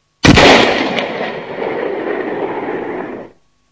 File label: gun